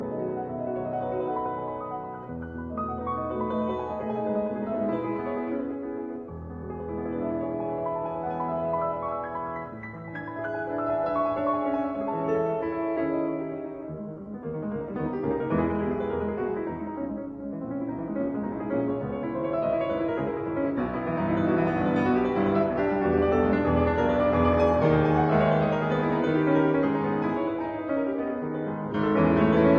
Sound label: Piano Sonata in G-sharp minor, Presto; Op 19; Sonata Fantasy